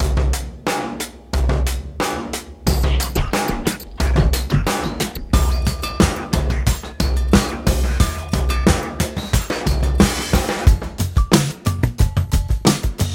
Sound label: Wall Of Funk; The Loop Loft; Track Stacks Vol 1